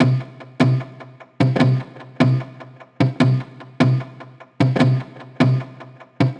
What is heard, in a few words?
150BPM Guitar Fx C; Antidote Advent